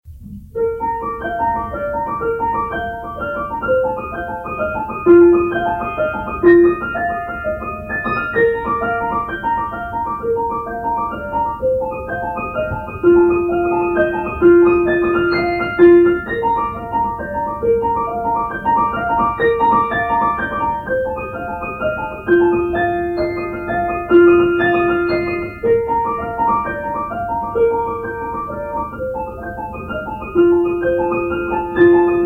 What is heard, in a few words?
music box